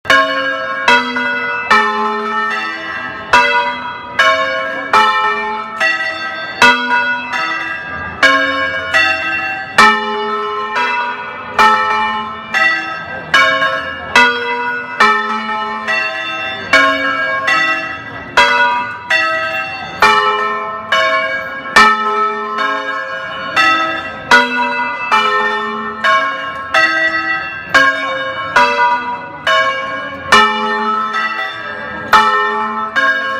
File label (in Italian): campane; Modena; Patrimonio 2019; concerto di campane in piazza